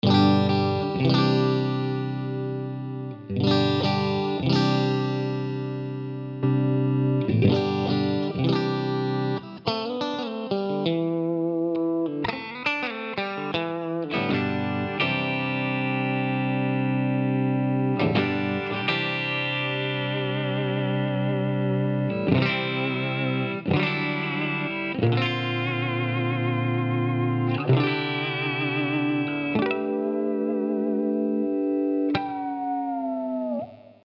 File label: Constrictor compact strat; line6; tonecore; simulanalog